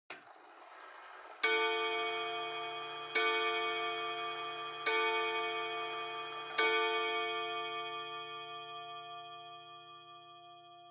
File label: Pendulum Clock Chime Long